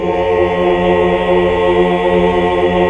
AAH 2 6 R; Best Service Gigapack 1

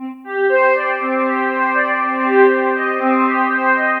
Synth Textures

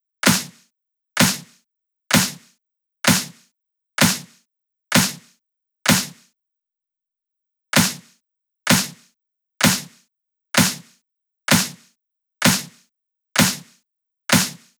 Loop 128BPM Clap; VEC5 Drumloops; VEC5 Multi Loops 128BPM; Loop Elements

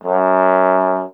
Trombone F#; Miroslav Vitous - Solo Instruments VOL-1

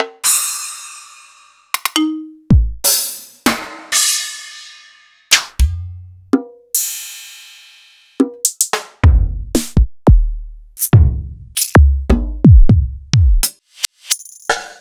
EMU Orbit

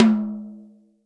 TOM, Real Drum Kit, FUNK KIT

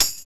tambouri; Roland - LA Composer vol. 1

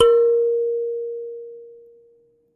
Finger, Prepared Kit; Battery 3 Sample Kits

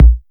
Bassdrum

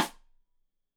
Side Stick Stereo; Deeply Sampled Drums

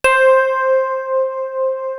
clear piano; East West - Global Sounds; S.I KEYS

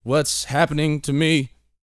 GOOFY, Vocal Planet R&B Dance SCI-FI; 5 SCI-FI CHZ

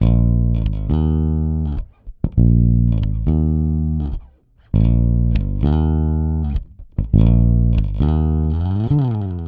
Rock, Bass; Bass X

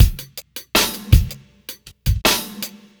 Breakdown Beat, Drum N Bass; Sonic Foundry (Sony Creative Software); Knutrix Drum & Bass

